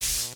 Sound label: Ambient Electric Shock; Among Us